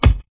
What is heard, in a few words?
good steel kick drum a sharp key, Royality free kick drum sound tuned to the A# note; kick drum samples